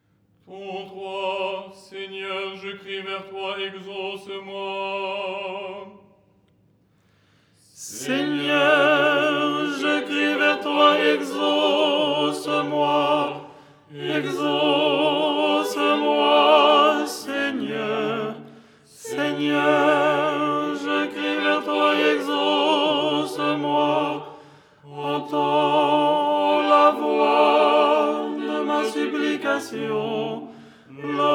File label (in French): tenor; ton 3